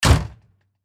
Fire Truck Back, Fire Truck Back Door Close Interior; Emergency; Emergency Vehicle Sound Effects